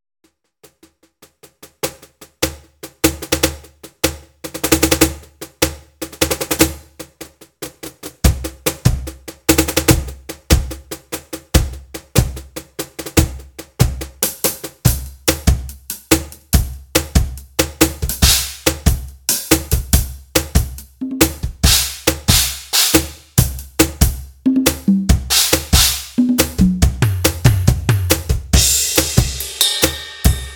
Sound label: gewa G5 Modul Latin Perc Set